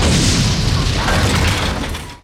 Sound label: EXPLOS; Best Service; Advanced Mega Effects